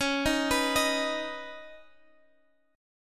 Db 7th Suspended 2nd Db, down, Guitar Half Step Down